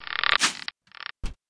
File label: ab attack; propack